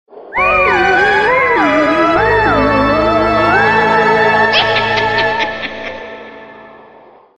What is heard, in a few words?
nokia horror message ringtone